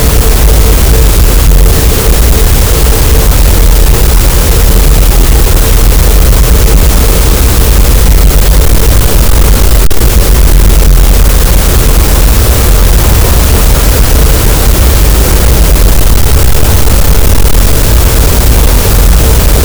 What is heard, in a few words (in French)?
cachalot, gaussian noise; Physeter Macrocephalus